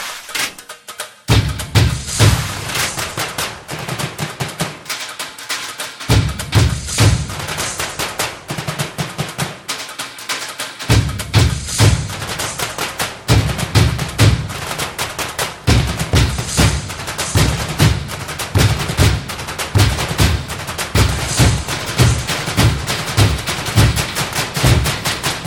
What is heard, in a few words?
drum beats; dhoom 2